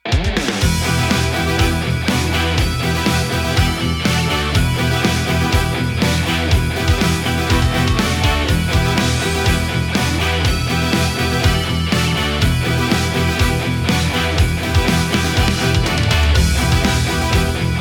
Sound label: VICTORY LONG